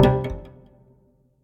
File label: Col Legno Cello; Instruments; Distressed Strings; Selector; Ableton; Mood Reel